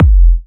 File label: Kick; M8; Electronisounds